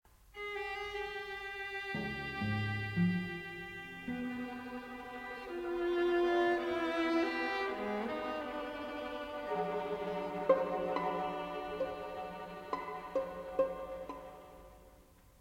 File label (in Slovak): Hudba pre flautu a slacikove kvarteto